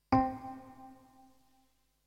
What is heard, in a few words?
EP Release Time